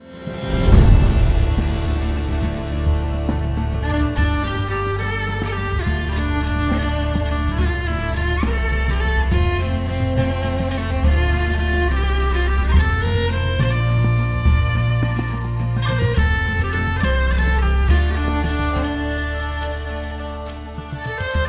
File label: Treble Jig